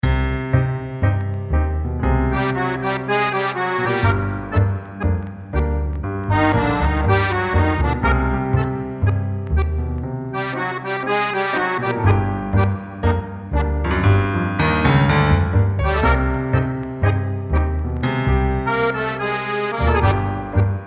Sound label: TANGO